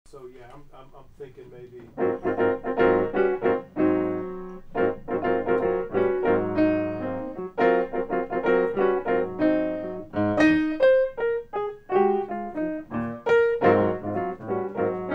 playing, A riff song in a classic swing-to-bop style